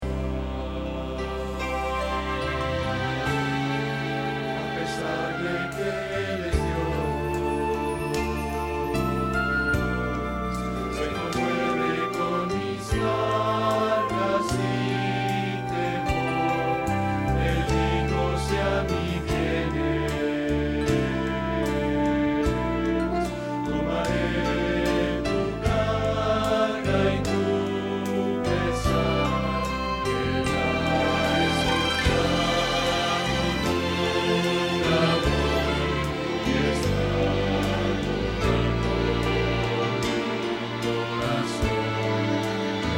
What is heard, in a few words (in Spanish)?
Bajo